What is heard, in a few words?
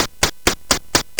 Fast Sfx, CSG8580R5 sid